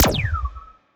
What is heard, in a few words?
healing muzzle, weapons; Stellaris; biogenesis